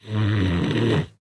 Snore In; smite; kumbhakarna

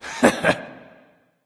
confused; smite; anubis

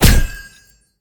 projectile hit; smite; tiamat

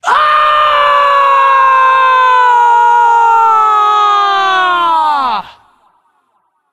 battlecry; smite; tiamat

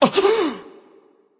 surprised; smite; agni

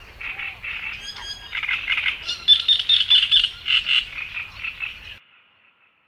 ambience bad birds; smite; conquest

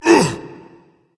surprised berserker; cuchulainn

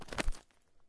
angelic defeat cloth; Smite; Thanatos

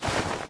footstep cloth; smite; guanyu

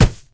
Punch Ground; smite; kumbhakarna

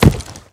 horse hoof; smite; guanyu

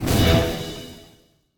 pwr sm fire; smite; god baronsamedi

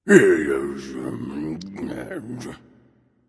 grumble; smite; osiris

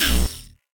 debuff; Smite; god Janus

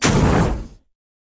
projectile; smite; god raijin

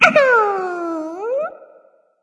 ouch; smite; izanami

